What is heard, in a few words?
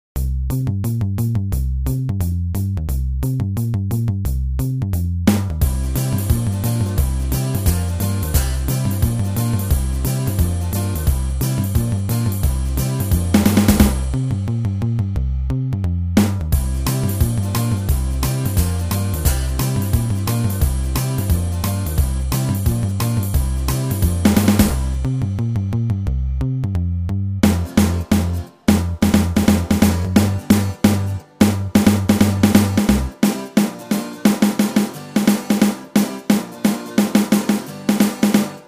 backing